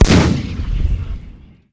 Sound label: big gun; Falcon4